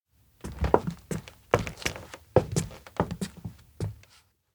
steps outdoor lakeside search; awayuki; anemoi

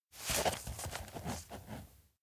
map write; awayuki; anemoi パッケージ版

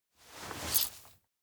collar receive; jet; anemoi パッケージ版 1.1

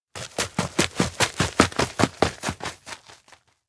steps outdoor log house front run; trex; anemoi

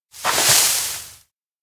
rustle noise; anemoi

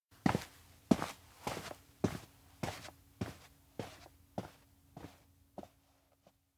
steps outdoor rebuild town leave; massan; anemoi

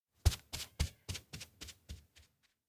steps outdoor observatory brisk walk kigurumi; awayuki; anemoi

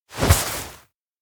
step on moss; anemoi

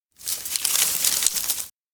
gather branches stones; anemoi

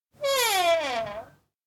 door observatory close; anemoi

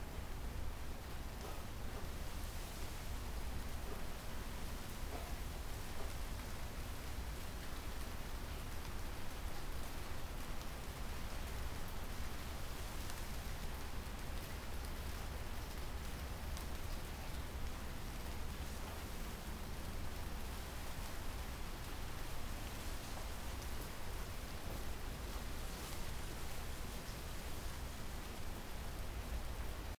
outdoor town light rain

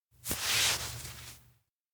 tie waist rope; anemoi パッケージ版

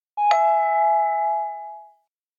elec ping warning; anemoi

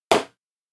mouth catch disc; tsumire; anemoi パッケージ版

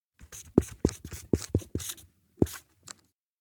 board write; anemoi

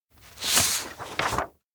pledge offer; anemoi

